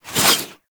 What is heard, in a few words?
Warfan Whoosh; Warframe; Weapons Melee